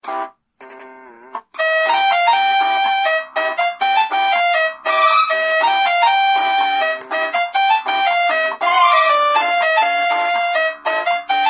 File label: song, nokia phone